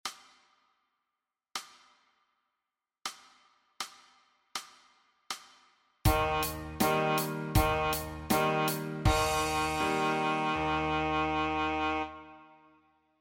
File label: sax C